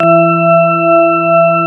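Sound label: CX3 organ E3 L, Keyboards Old School; USB Soundscan vol.09 - Keyboards Old School; AKAI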